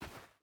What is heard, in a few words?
footstep hide sand walk; Rust